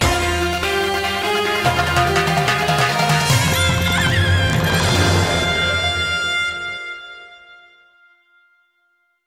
Commercial In; Malayalam Ver; Millionaire Soundtrack; Indian Soundtrack [2011 - now]

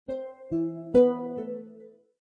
Menu Command, Windows; Whistler; Whistler2

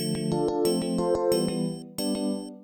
Alarm

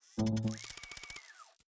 Windows Hardware Fail

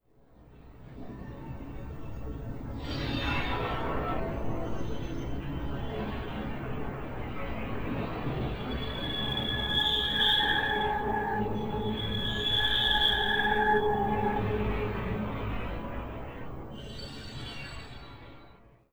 ambient Steam; Xbox

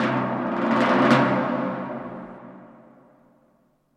drum roll; Millionaire Soundtrack

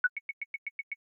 Camera Countdown Imminent Haptic; i OS; iOS10; nano

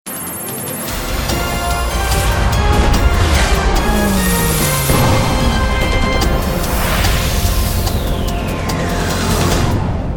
Opening Titles Animation; Millionaire Soundtrack; US Clock (2008)

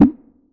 complete

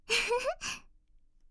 empty recyclebin; Windows; Madobe Yuu

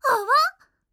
asterisk, Windows 8; Madobe Ai

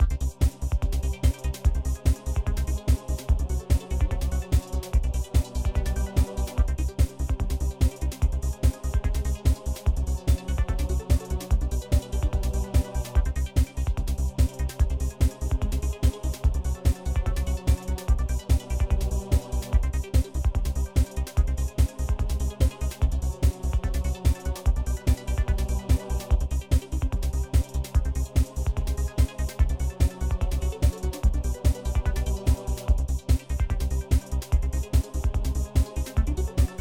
Background Music; Millionaire Soundtrack

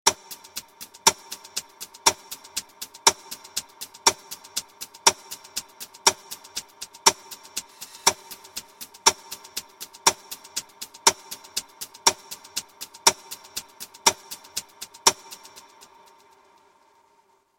Clock; Millionaire Soundtrack; US Clock (2008)

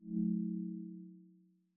navigation pop; i OS; iOS10

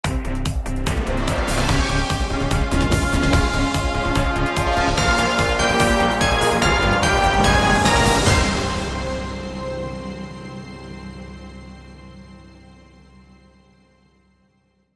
Hello New Punter Long; Millionaire Soundtrack; US Clock (2008)

